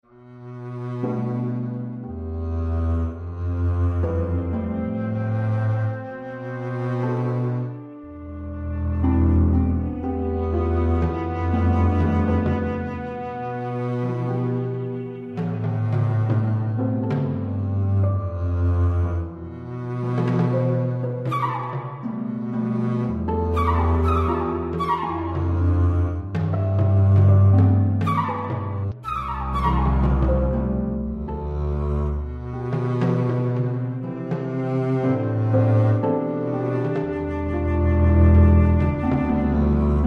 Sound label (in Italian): Arpa Contrb Flauto Rototoms